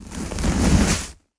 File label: facial tissue pull